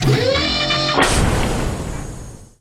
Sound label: Elevator Switch Powerup